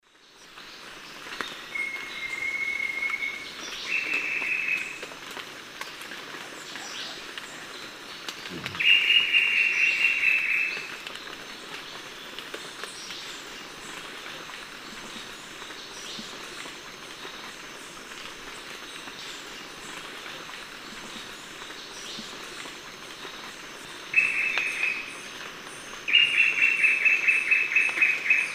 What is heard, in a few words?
Formicarius analis, alarm calls 2 birds; S. America; Eastern Lowlands